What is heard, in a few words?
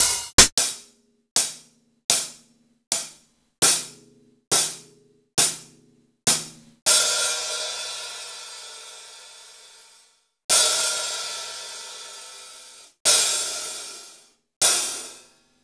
Roland BK-5 Hi Hats